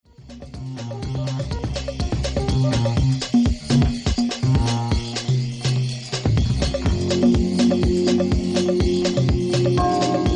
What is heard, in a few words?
Dub